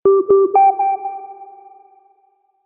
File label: Soft Subtle Notification; Musical